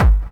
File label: hard bass drumz